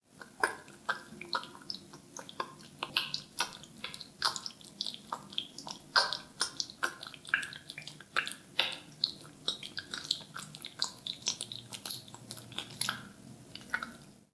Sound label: slime; Monster girl quest